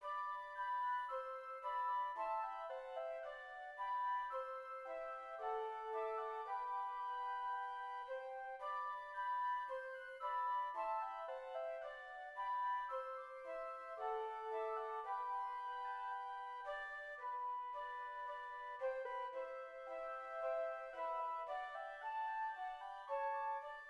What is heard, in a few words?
Flutes; All Things Bright and Beautiful (Royal Oak)